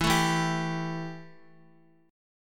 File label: E Minor Double Flat 5th E Guitar; Embb5 strummed; x,x,4,2,4,3